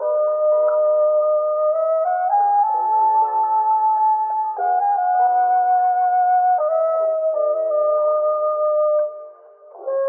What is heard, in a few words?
flute, Loops